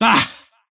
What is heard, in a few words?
parry fail; dota; kez